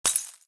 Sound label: imp bullet lightballistic solidglass debris; Titanfall 2